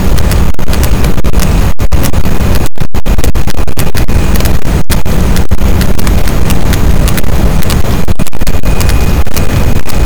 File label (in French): cachalot positif, boat, Physeter Macrocephalus